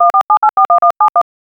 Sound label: phone unlock sound; devices